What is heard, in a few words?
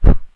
Kung Fu swish; attack